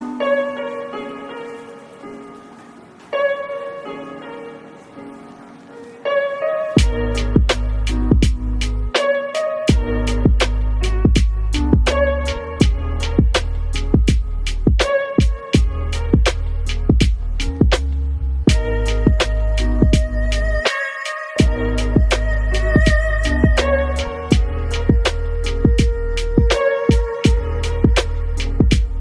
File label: calm wake up beat ringtone